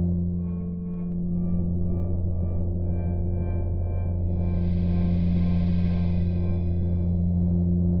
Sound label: portal Loop; ludumdare32